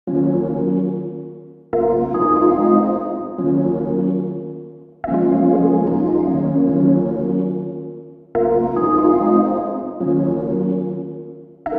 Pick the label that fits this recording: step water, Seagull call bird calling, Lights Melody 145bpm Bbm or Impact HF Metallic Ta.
Lights Melody 145bpm Bbm